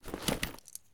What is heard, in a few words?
weap magin sbrosnik, weap; Escape from Tarkov